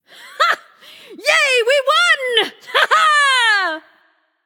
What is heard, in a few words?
"yay"; smite; nuwa